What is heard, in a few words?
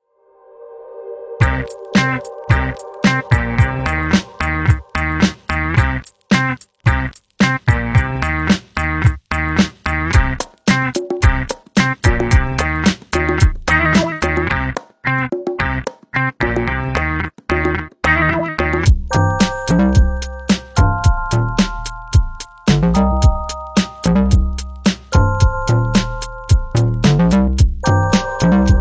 Low band, Ringtones; Nokia; 6500 slide